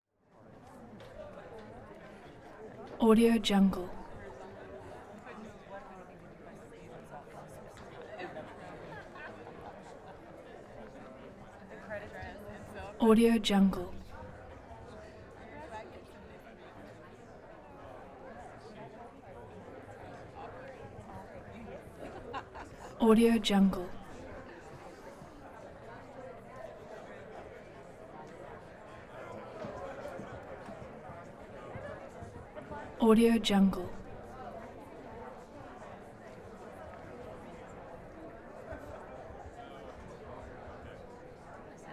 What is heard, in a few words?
theatre walla